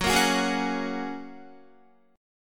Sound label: Gb Major Add 9th, down, Guitar; strummed; JGuitar